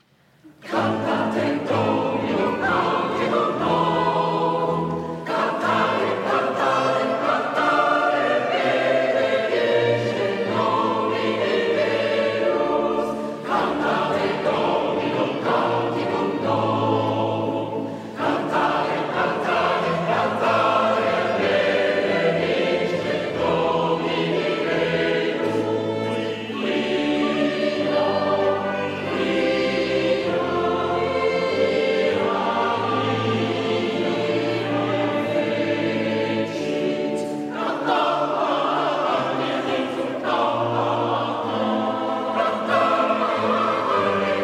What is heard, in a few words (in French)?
Cantate; Cantate Domino; Monteverdi; émotion baroque